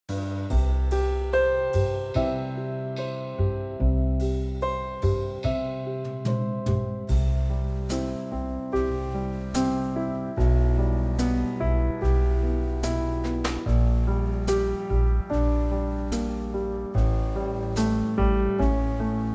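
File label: C trio, Backing Tracks for Male Vocals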